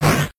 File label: mole attack; Crypt of the NecroDancer